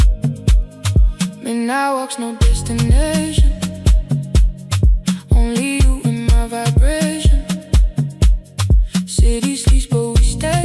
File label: Afro House